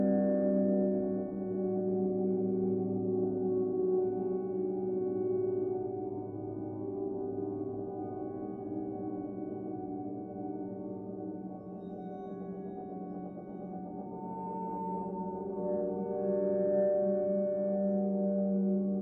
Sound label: Calm Loop; Void Bastards